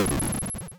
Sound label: death